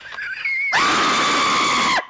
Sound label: Screech; Mully